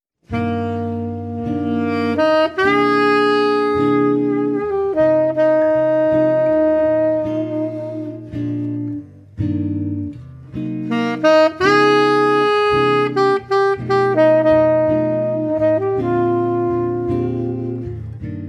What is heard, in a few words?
Jazz Duo